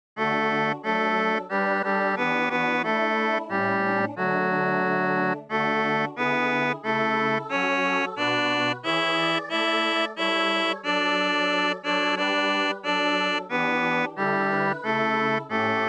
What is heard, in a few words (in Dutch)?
tenor; god zij met ons